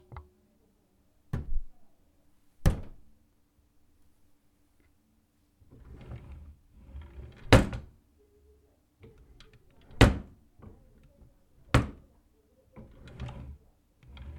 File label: Drawer